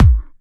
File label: Little Hard, Kick Drums; Zero-G; Soundclash